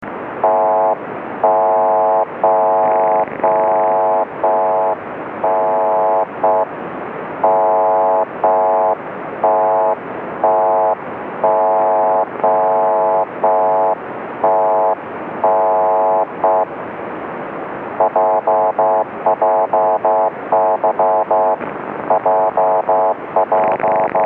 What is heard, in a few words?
jjy 40khz